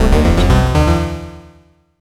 retro game over; wildemotion

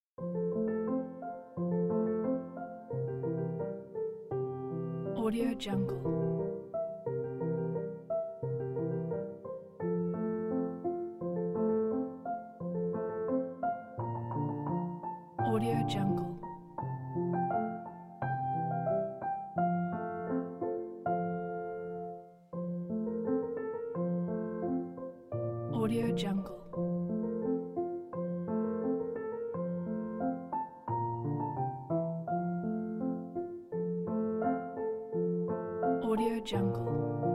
piano childrens polka; soundrose

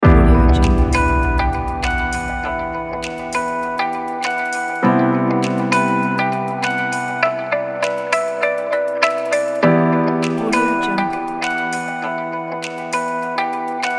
corporate ambient pack

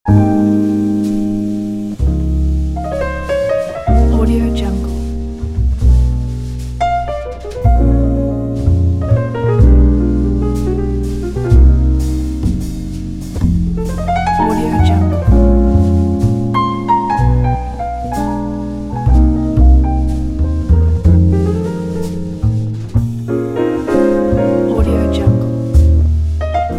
velvet night jazz

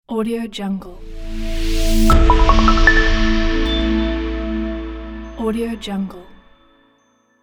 tv logo

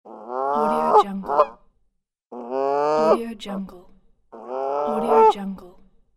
canada goose

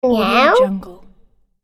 toddler says meow

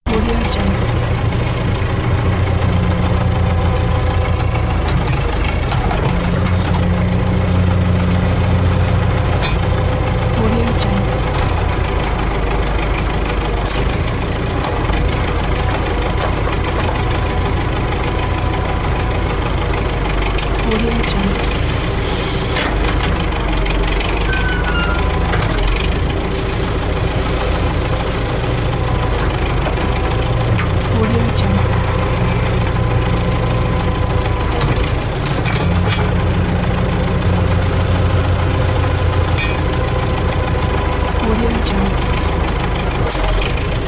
tractor

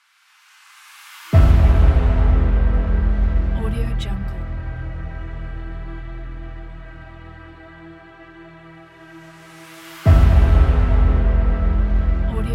epic hybrid trailer

